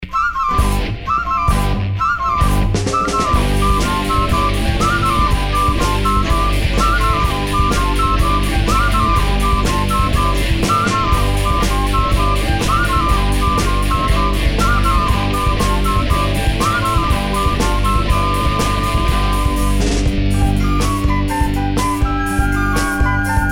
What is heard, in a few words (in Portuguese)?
orquestra mao de pau com violas